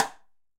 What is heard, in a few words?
Timbales C H Stick; Latin Percussion; Drum Hits; Ableton; Factory Packs